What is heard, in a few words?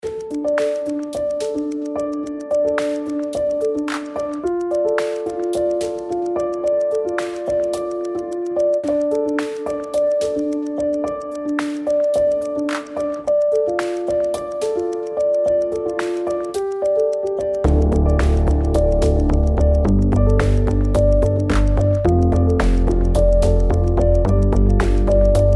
Tick in D